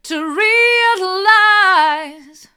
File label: to realise hard, vocal